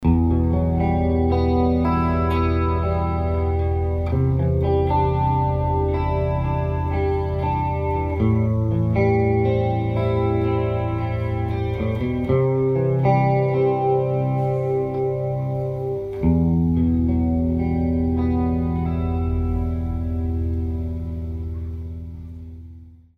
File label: SDD2000 Doubling